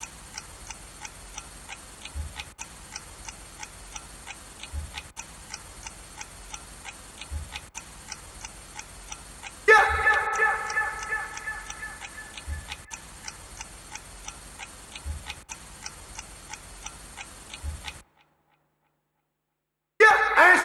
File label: 93 Gm Clock